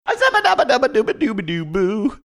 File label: scatting